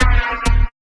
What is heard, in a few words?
Zappy Bumpers; Sonic Foundry; Esoterik Beatz; Zappy Fx